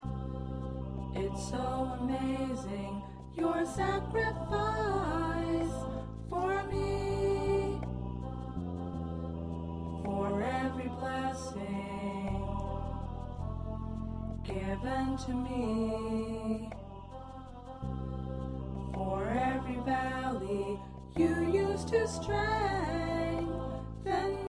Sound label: Soprano